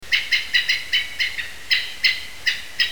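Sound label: dusky thrush; tugumi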